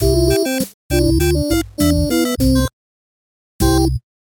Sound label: intro song; toystory; rex